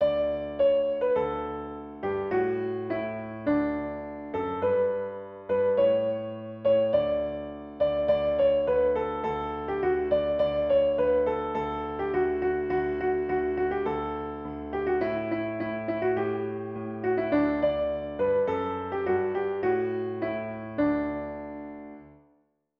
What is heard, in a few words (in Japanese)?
ピアノ